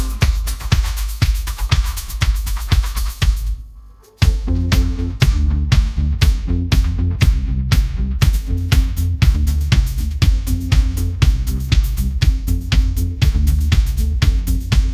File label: sunshine pop groove; ai